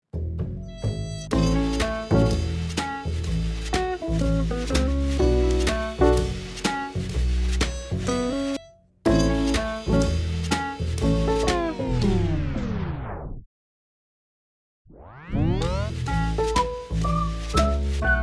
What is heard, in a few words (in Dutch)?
Muziek Solo